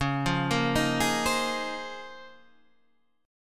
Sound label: A sharp Minor 11th